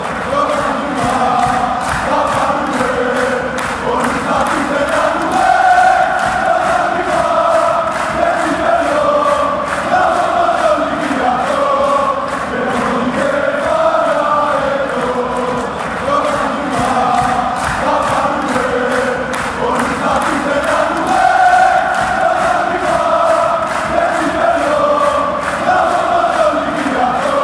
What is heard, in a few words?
panathinaikos chant